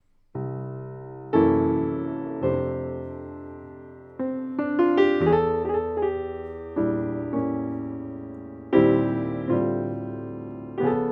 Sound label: PIANO TUBE